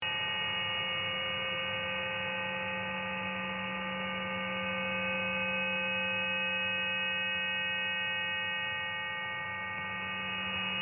Russian Sunflower Coastal OTHR; Podsolnukh

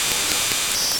UHF 406p05MHz

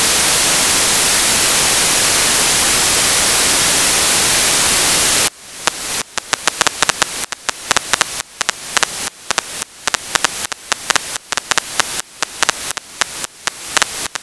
Bicycle sensor sound

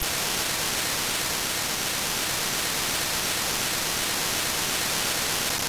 APCO25P2 voice

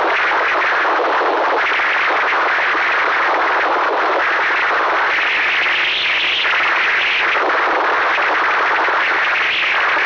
Weird Again at 4564

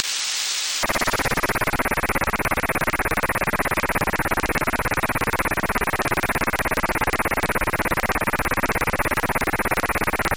DMR RC4, DMR encrypted with

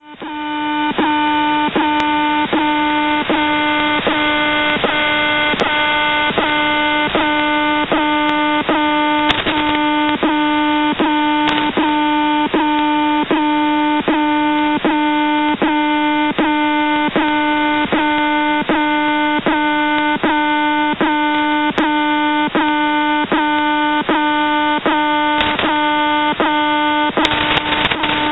The Alarm audio

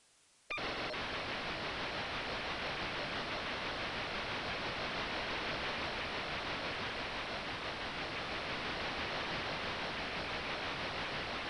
P25 CQPSK-VC IF

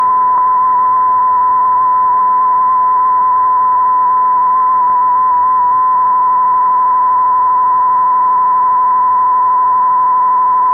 Weird marine signal

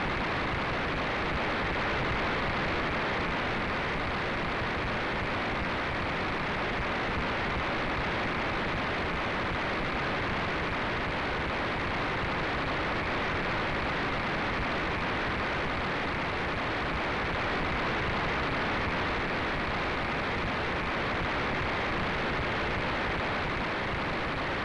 P25 C4FM CC IF

